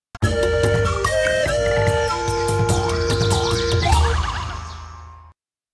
Tema de victoria; Pikmin; SSBB

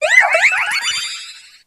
Grito de Carbink

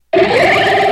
Grito de Quilladin

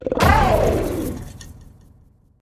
Grito de Koraidon transporte

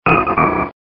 Grito de Wynaut

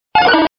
Grito de Wigglytuff

